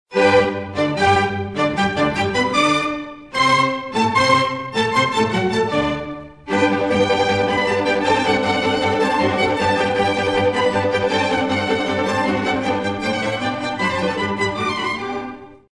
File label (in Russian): Рингтон(классика); МОЦАРТ